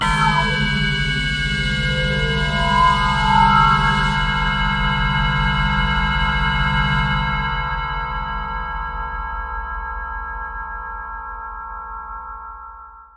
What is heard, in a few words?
disturbed machinery pad; Pads